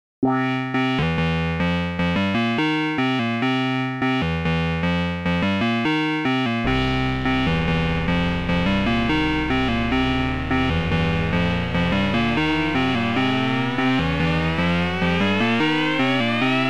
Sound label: glide pitch sample